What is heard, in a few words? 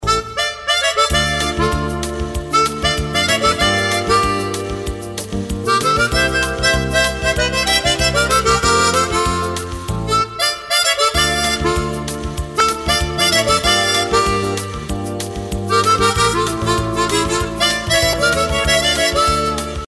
Tremolo Sample